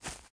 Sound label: footsteps grass